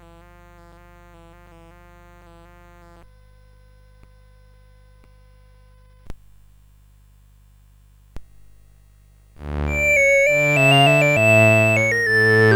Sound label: CSG8580; CSG8580R5